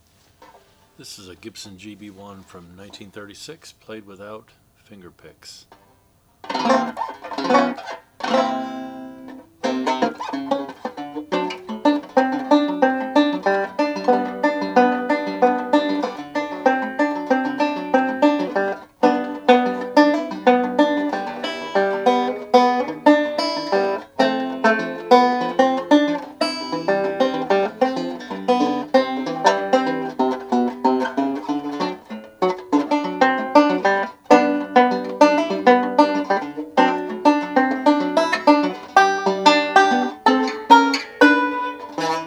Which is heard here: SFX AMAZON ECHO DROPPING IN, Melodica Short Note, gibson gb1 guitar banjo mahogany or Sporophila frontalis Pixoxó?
gibson gb1 guitar banjo mahogany